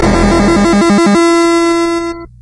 trail Off

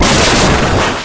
lightning

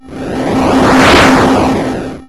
sweep

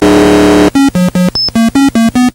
bleep

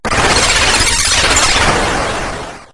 psycho Bird

